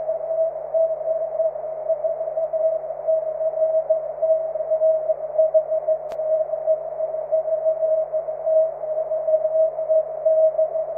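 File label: cw, 23 cm EME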